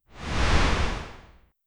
a soft neutral transition

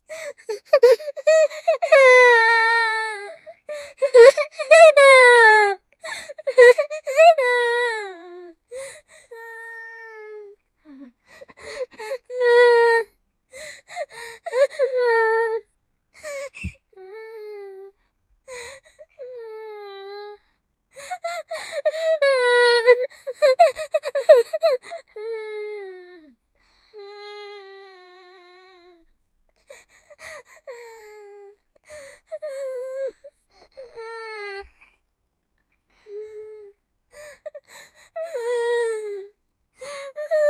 hopeless 10 year old girl, sadness

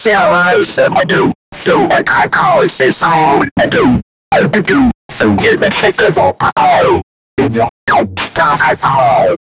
energetic crowd chanting

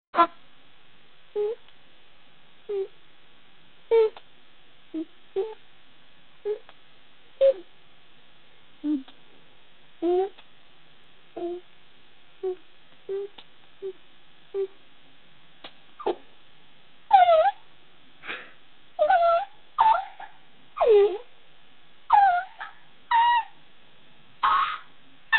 sex sound plisssssss

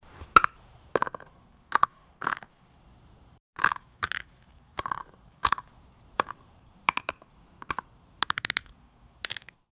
a single bit of one, crunch

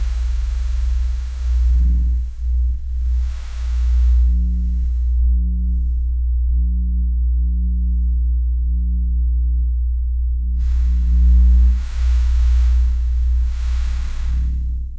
whoosh sound of leap

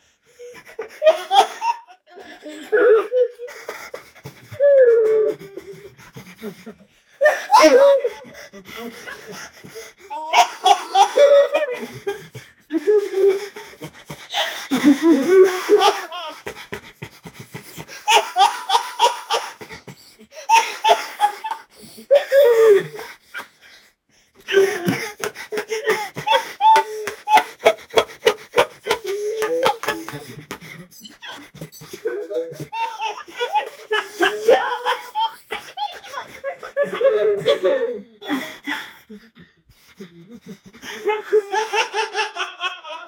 some young adults guy; dogs

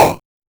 short punchy impact sound, video games

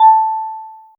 notification sound for a, cute

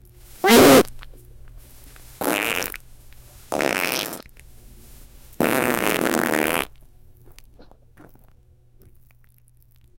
big farts and a sudden

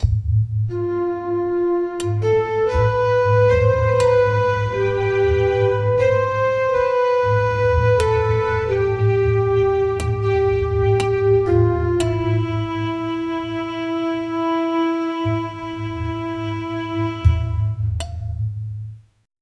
serene instrumen, asian

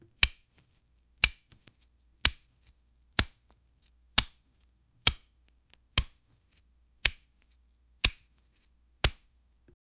sharp blade slash meat

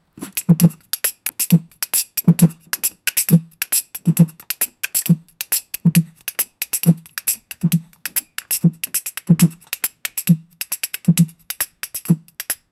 the beatbox of an elderly

